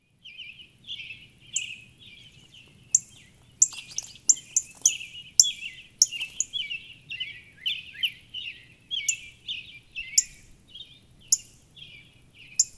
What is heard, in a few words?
Northern Cardinal; Cardinals awaking